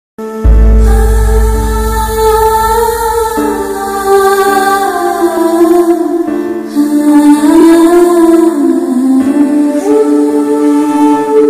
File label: beautiful Lord Shiva melody bgm music; bgm guru